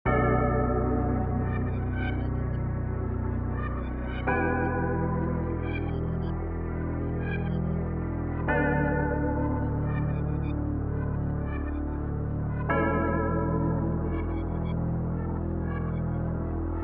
Late night loop

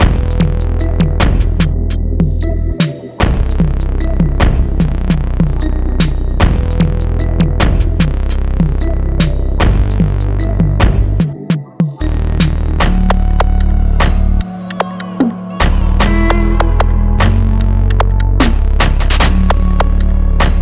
winter party sexy drill; spice time sexy drill bundle by raven

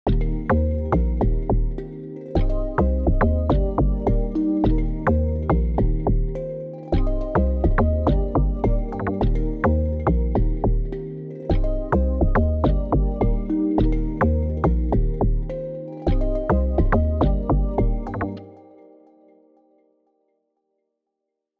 Bamboo G min 105 BPM

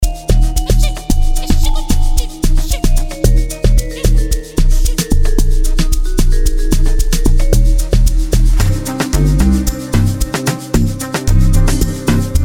Amapiano Kasi Sound; 2025 super amapiano bundle by sliquejams

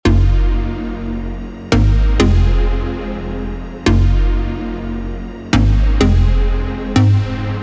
COMPLEX loop

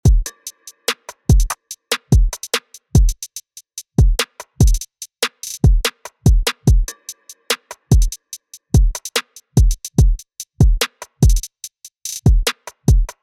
affinity loop

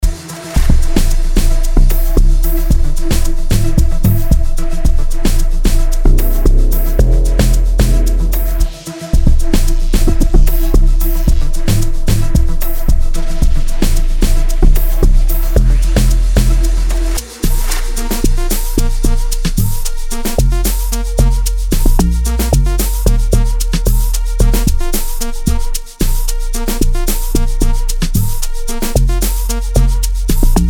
Synth pack; 2025 super amapiano bundle by sliquejams

